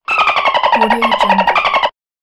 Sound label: dolphin sound